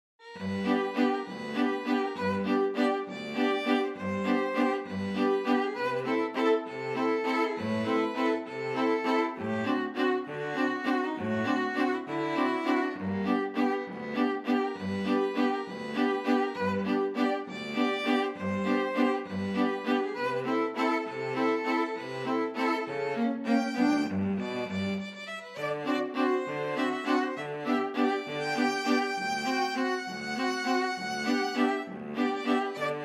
string quartet

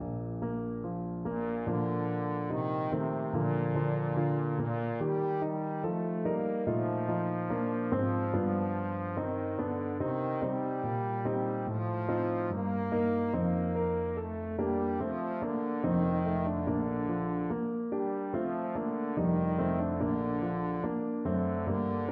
trombone

